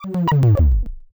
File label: soft; sfx; cirquit